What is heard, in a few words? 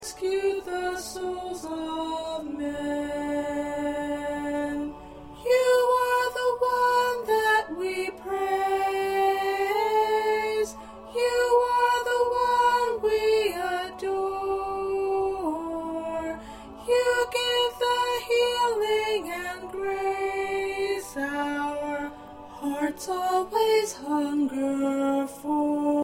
2nd Soprano